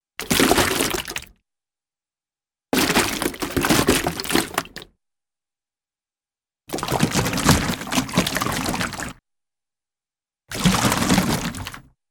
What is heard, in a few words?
Splashy Movement Through Thick Goopy Liquid, Cartoon; Hollywood Edge; Cartoon Trax